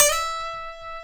F#, funk guitars

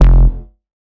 Bass F, Bass Synth

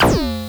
FM BOOm, MultiMoog; Drums

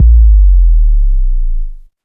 KICK XL, Big Beat; Big Beat Samples & More; AKAI

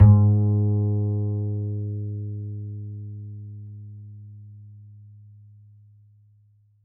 Upright Acoustic Bass

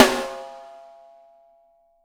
6X12 WOOD

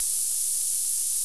longhi, Drums, Memory Rhythm SR-88

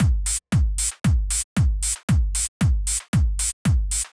Drumloop 115bpm, Nu Disco; Zero-G

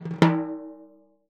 TIMBHI SHO, Synclavier Percussion; ILIO; Synclavier Percussion 2; World & Orchestral

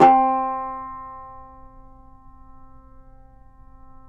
YAYLI TAMBUR C; Spectrasonics; Hans Zimmer Guitars VOL-2

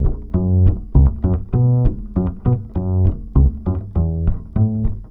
BASS, Balkanish Traditions

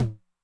Mid Tom Noise Med, 909; classic machines; MT - MID TOM

